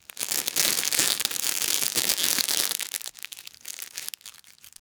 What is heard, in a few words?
sound of wrapping paper, holiday